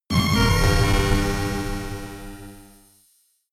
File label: horror orchestra short